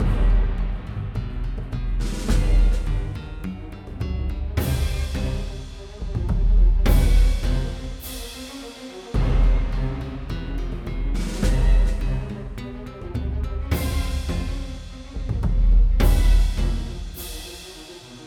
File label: music evil; northern tale 2